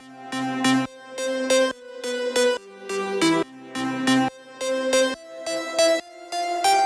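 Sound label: Lead A, trap; Trap (Трэп)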